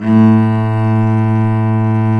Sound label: cello, Arco Solo; String Master Series